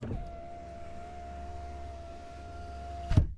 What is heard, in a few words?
door close; Golden Crayon Sounds